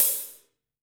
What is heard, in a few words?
HH hh; Best Service Gigapack 2